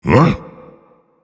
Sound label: Vocal Colossus Pain Reactions